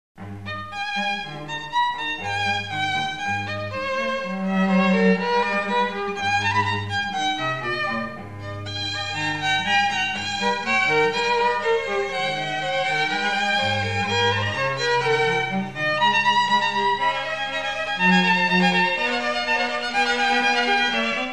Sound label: trio g minor; vivaldi